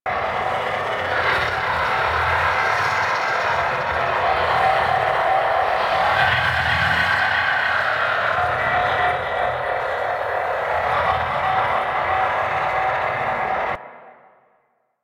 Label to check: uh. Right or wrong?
Wrong